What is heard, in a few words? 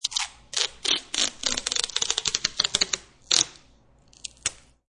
Masking Tape Long Pull Rip, Pulling masking tape with rip; Tape Sound Effects; Offices